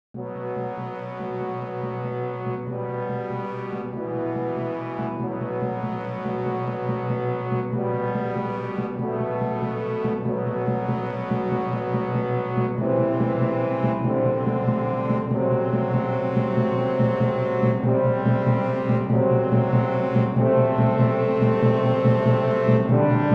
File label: timpanic beat